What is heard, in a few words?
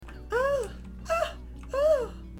squeal